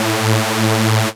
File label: Synths; Vengeance Samples